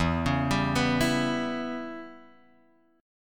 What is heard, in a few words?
E Minor 6th guitar chord, Drop D